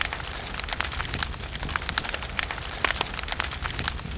amb fire loop; Spearhead Demo

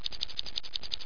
spider

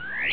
backgnd bird; “Tweety” Sounds; Ambiance

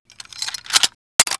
m1 reload, weapons foley; Spearhead Demo; MacFormat 2003 June

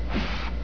Erase Up, Blackboard

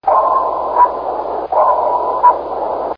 SONAR; Pocket PC Game Programming

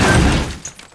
Impact; urbanchaos

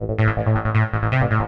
rubber, bass, 160bpm; hous-tec; Magix Music Maker & Editor

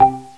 organ; Descent II

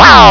Shield fail; FF 0.3.4 public beta; Arcade Games

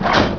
spikes; Prince of Persia

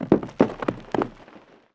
ROCKFALL; ULTRA GAMEPLAYERS

